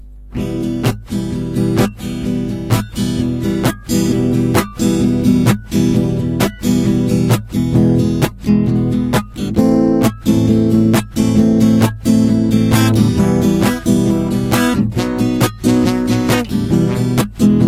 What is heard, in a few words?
guitar; STALKER